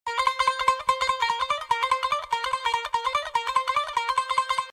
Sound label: Sms Bhangra